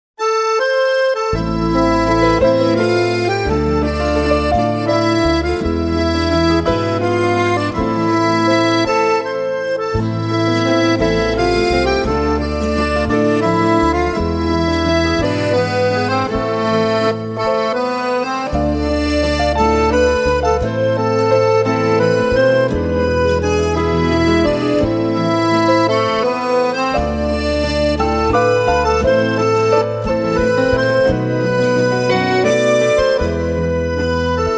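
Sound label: garmon val, accordion waltz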